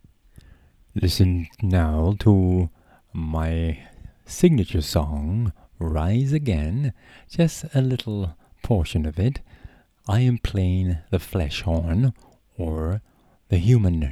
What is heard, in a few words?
human trumpet